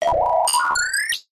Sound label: science fiction robot chirp beep metallic short